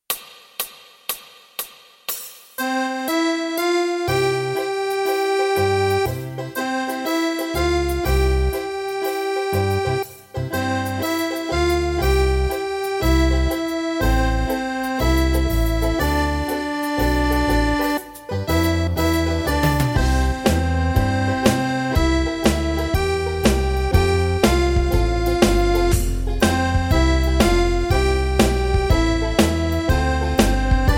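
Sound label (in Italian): When the Saints, Fisarmonica 1 mano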